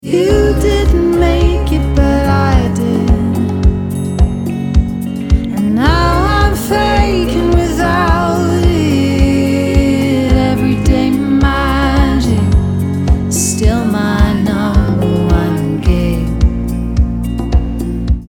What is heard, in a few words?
Everyday Magic Ringtone